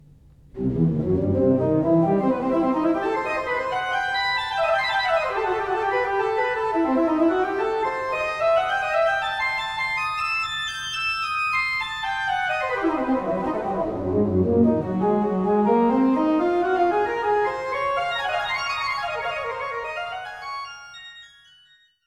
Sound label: scales